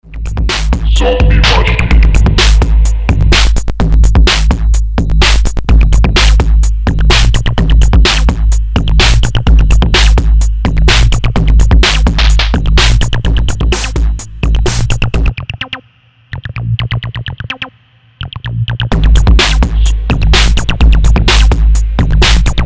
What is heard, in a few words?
Zombiemachine Acid